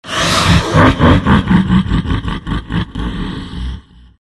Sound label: laugh; Outworld Destroyer